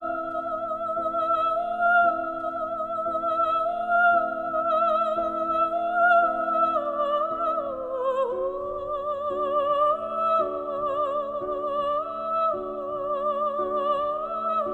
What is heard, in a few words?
voice lament, Loops, Sad